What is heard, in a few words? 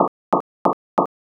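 footsteps soil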